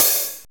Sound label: long hat; drumware